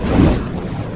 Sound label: NAPALM